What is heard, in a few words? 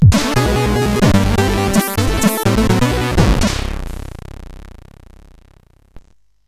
Incidental sid CSG8580R5; Street Fighter II; hvsc